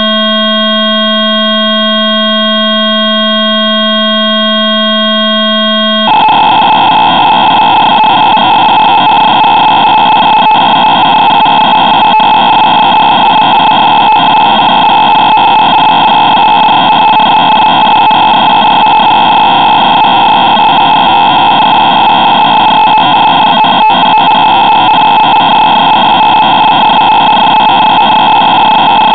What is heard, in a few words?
Sequential Circuits Prophet 600 factory presets, Cassette Interface; Synthesizer Factory Patches